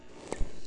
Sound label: chirp; VLF whistle